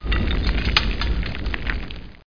DEBRIS; FLY

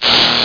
Sound Electric

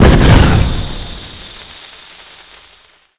chem explosion effect; Earth and Beyond; EA Games

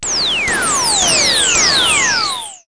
Space Gun Shot, Space, Battles; 16-bit; KABOOM! - Wild and Wacky Sound Software; 1,000 Sounds

